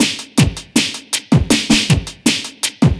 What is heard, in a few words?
Beat, Futurist Drum & Bass; Drums & Percussion